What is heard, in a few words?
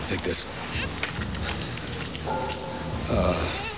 uuh